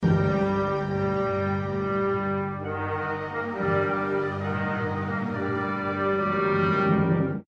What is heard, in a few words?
theme alert; dark side